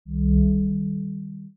hover, dark sci fi; dark sci-fi audio pack

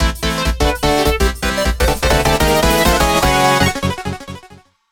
For a sudden and intense burst of energy, fanfare

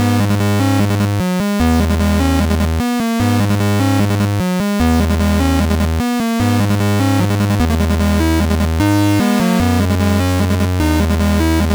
chiptune

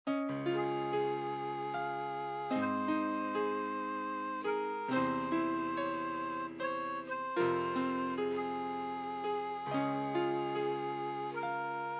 ska cuhachi melody

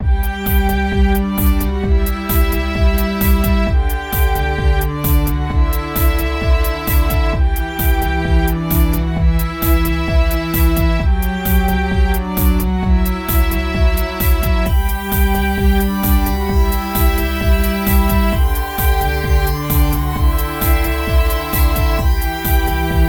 title in game

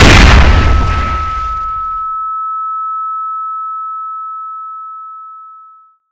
flash bang

